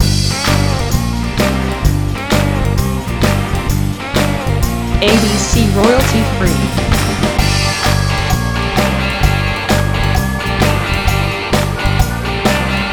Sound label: Blues Rock Action